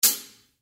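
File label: closed hat, drums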